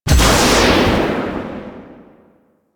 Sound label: Spear Throw Impact; BE Ferrox; Spear Gun